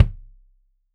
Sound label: Kik Stereo; Deeply Sampled Drums; SMDrum